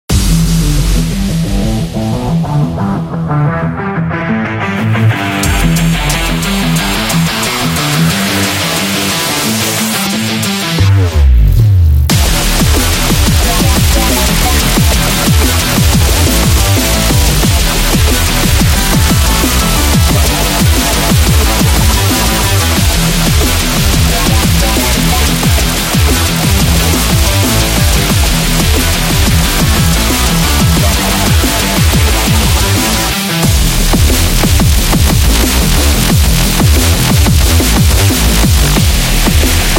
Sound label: Dubstep Event